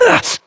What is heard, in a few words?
grunt hits; Titanfall 2